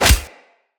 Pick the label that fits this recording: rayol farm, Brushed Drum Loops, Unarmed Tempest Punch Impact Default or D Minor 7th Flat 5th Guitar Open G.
Unarmed Tempest Punch Impact Default